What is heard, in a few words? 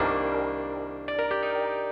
E PIANO 125, Keys; Houseworx